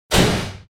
door close

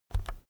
keyboard external key press fast soft

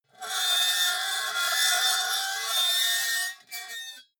metal lid screech short

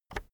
keyboard external enter push soft

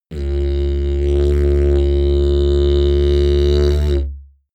didgeridoo heavy soft

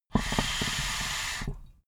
water pipe puff short

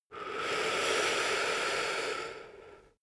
male breath slow out

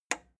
plastic button small simple push

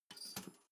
toaster pull down

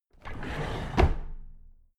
drawer big squeak close heavy

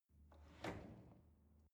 drawer cutlery open draw short soft

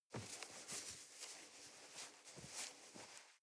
nylon leather very soft

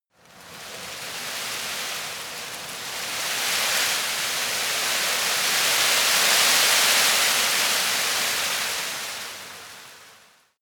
tree leaves rustle medium

